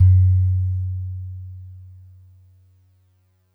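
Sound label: DreamLike DX100 F sharp -1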